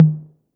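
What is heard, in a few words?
Roland808 mid tom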